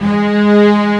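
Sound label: String Sus g sharp 3; Nintendo; July 2020 Gigaleak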